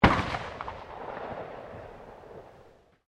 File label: RIFLE UNKNOWN FIRING DISTANT; Rifles, non military; Guns